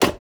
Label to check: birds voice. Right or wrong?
Wrong